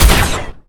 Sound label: turret gun, weapons; Quake 4 Alpha